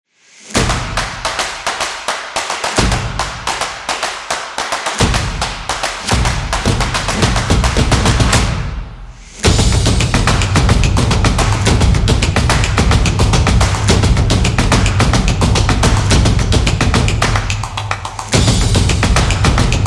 Stomping and Drumming; snaps and claps

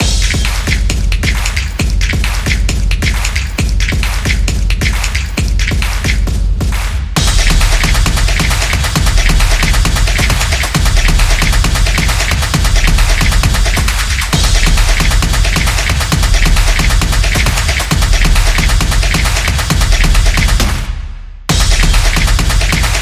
Sport Vlog; action sport

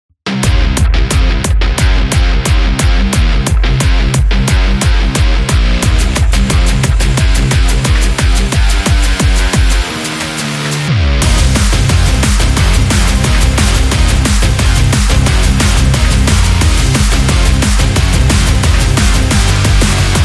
Positive Rock Energy

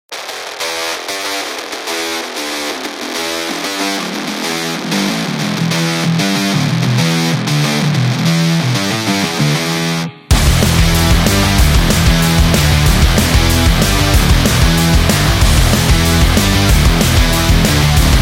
Garage Rock

